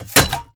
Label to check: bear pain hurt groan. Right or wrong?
Wrong